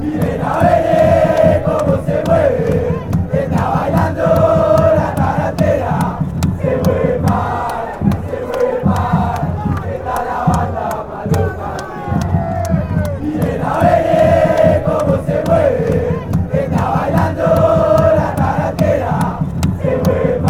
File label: the tarantella, chants